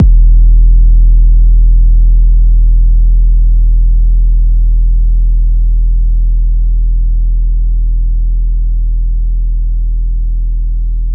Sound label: 808 E; Abletunes